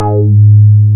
BAS MINI, MOOG; Sound & Vision - Gigapack II CD 1

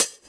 Hhat, Hihat; Equipped Music; Loungin House

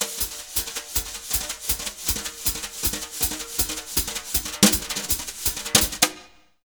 Jazz Latin Drumloops; USB Soundscan vol.08; AKAI

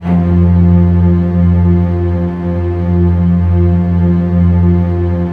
DOUBLE BASS; ILIO; Kirk Hunter Virtuoso Strings VOL-2; 90 s Sample CDs; 5DOUBLEBASS

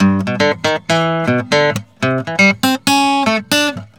GUITAR SALSA

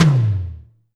tom lo, Alesis DM5

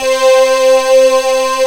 PAD, SYN PAD

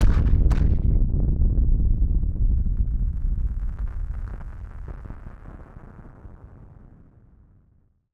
Firework FX; musicradar; bomb factory

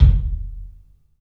KIK, LIVE CLUB KK, Drums; 3D Sound

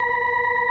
ORCHESTRON B3; Sonic Reality; Vintage Timetraveler

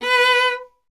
viola B; Miroslav Vitous; Solo Instruments VOL-1

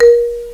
MARIMBA, MALLETS; FAIRLIGHT CMI IIX

